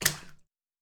Puddle

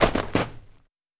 Snow

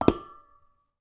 Metal

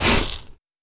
Sand gravel

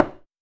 High Heel

